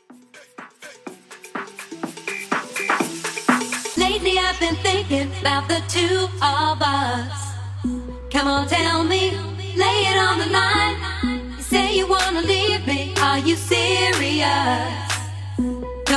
80s Deep House Mashup

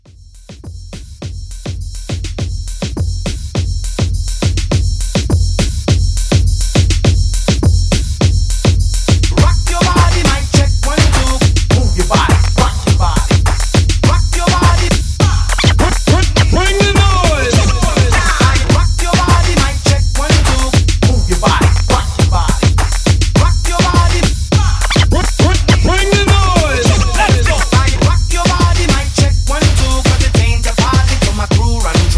Moombah Hype 103

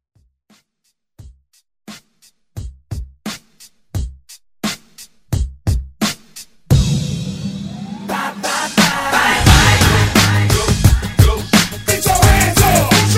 90s Pop Hype Redrum

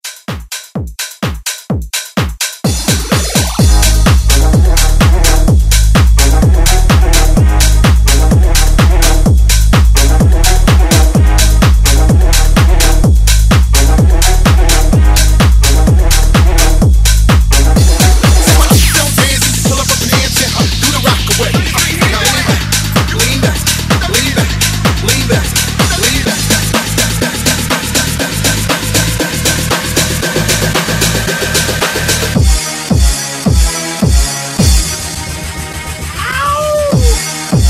Party Break Transition

